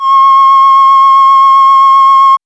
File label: Moog C 6 -R; AMG - Vince Clarke Lucky Bastard